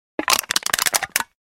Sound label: Beer Crush